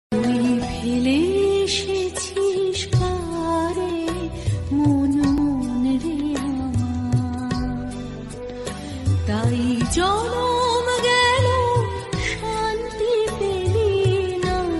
rabindrasangeet bengali ringtone new bangla ringtone; tui fele esechis kare